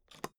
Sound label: suitcase pull down latch lock